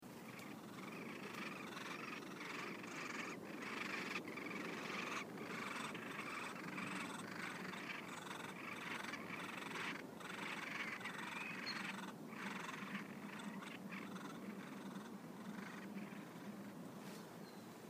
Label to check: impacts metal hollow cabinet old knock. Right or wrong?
Wrong